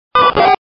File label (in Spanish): Grito de Ledian